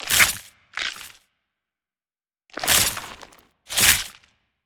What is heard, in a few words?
raw idle; khazix